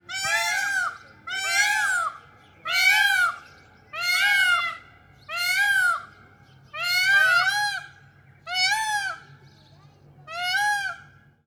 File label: Peacocks Singing; SES Animals SFX